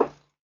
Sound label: footstep